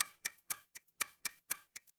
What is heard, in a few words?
mechanical clock tick